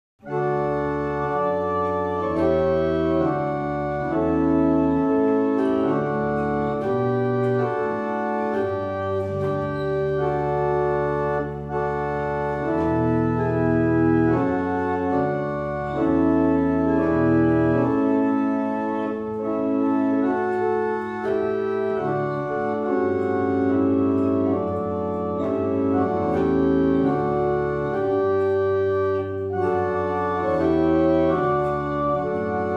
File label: barrel organs; Brightwell